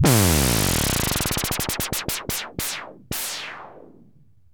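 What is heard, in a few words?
NOISE FALL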